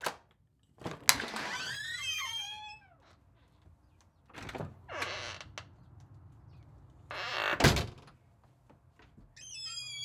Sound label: Household Door Front Door Unlock Open Door And Screen Door Close And Lock